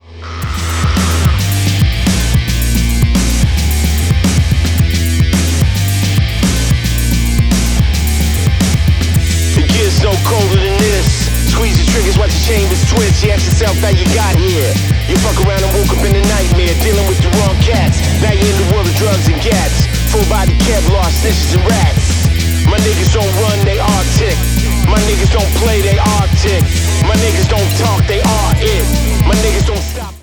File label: vocal